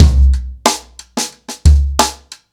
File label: 121 bpm drum beat c key